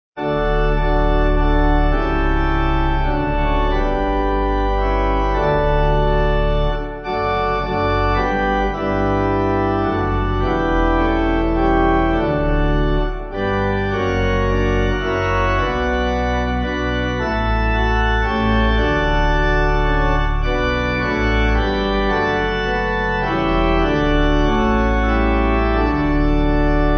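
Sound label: Pipe, Pipe Organ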